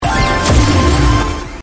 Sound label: open; Brazil; slottiger